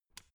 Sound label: switch turn on soft